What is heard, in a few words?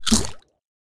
fishing bite; npcs